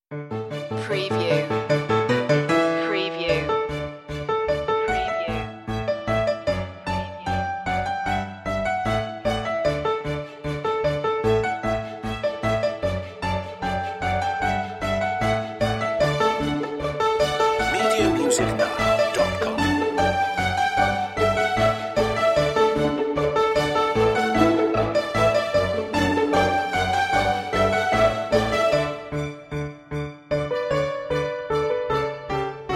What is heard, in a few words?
no mallets